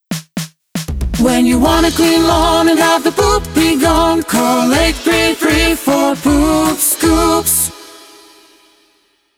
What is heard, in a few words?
JINGLE poop scoops